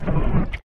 Bone Close

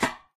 Default Metal

